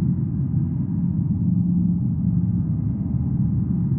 sparse soundscape samples; ReLooped

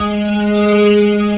G#, The Piano; InVision Interactive

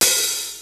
XR-10 K+SN+H, drums; AKAI; Rubber Chicken Software Sample Bank; DRUMS + PERC

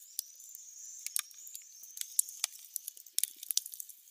Campfire; outdoor; Camping

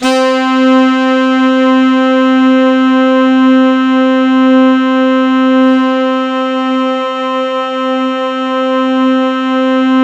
C4, Synth Line; 90 s Sample CDs; NiceBeats; SynthLine VOL-27

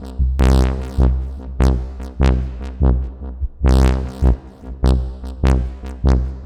Acid Bass; Sonic Foundry (Sony Creative Software); Knutrix Drum & Bass

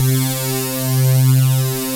TECHNO; Pro-Rec - Synthsonic; Z1 TECHNO

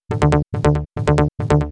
140BPM D; Vengeance - Essential Clubsounds Vol.4

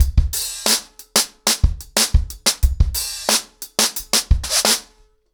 90 BPM, acoustic drums, drumloops

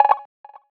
perc odd cowbell; neuro; Sinistarr; Drums

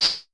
HH, Vermona Mono15 Drums; Stereo layers; Swordfight

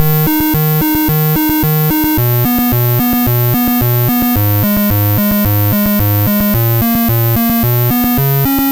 Apple II Bass, Chiptune

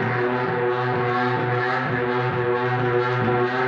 Hondo Fuzz FX 130 B, guitar drone samples 130bpm

